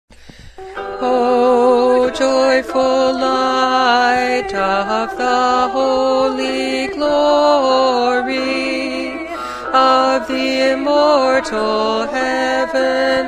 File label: o joyful light alto overdub